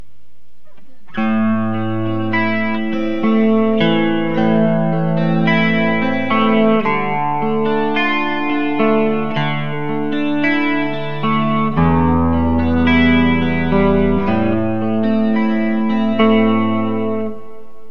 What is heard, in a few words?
fugly neck clean specpick; burny; fugly clips